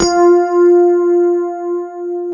F5, Super Rhodes; Orchestral; FL Keys

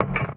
One Shot, Hits

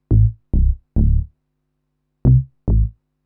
SNTH BASS TEKNO 140 A

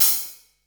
HAT; CYMBAL STUFF

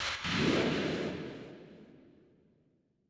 FX Industrial 125

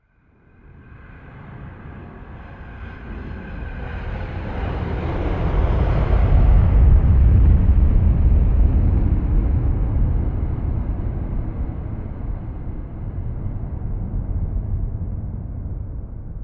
SPACE SHIPS; SpaceSounds

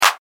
Clap; Dance

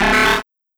TECHNO EXTRA 140

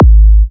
Minimal Kick, Drums, Kicks

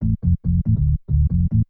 TECHNO BASS 140 A

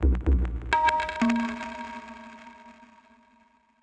PERCUSSN ETHNO 125, Drum

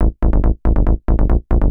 TECHNO BASS 140 A

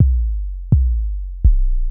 HOUSE BASS 125 A

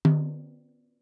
Toms; Touch Loops; CosmicFunk

